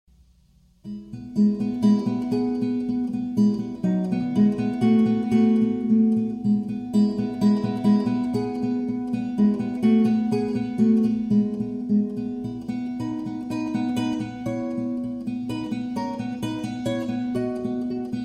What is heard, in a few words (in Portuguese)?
violão estudo mi maior; Sor; Coste